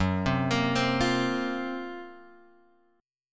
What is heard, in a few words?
F sharp Major 7th Flat 5th; F#M7b5 Chord {2 3 X 3 1 1} arpeggiated